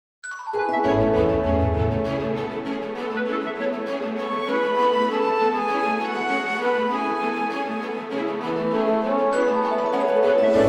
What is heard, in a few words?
Film Scoring Playful magical cartoon